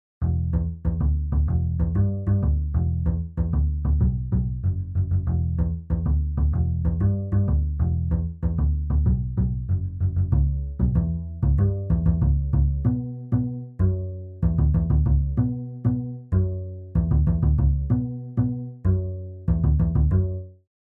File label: strings double bass; captains strings